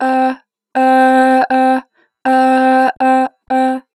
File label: Ah, Harmor Vocals; 2 bar flat; Pitch Flat